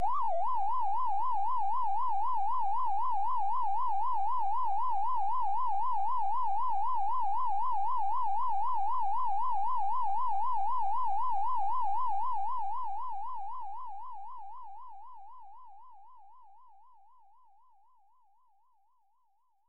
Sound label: In-Game Ambience; pac man 2 the new adventures 1994 snes